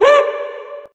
"woo"; Voice; ooh ahh

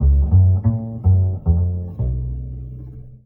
bass acid, Loops

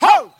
"ho", voice

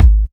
pump, Kicks Electro

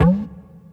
kindling, Misc Percussion Ticks

